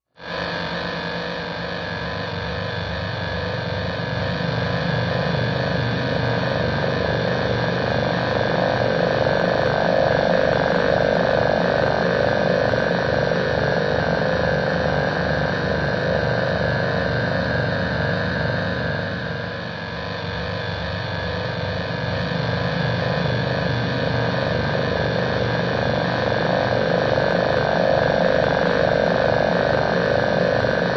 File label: Irritation, Machine, Alarm, Electric, Generation, High; Motor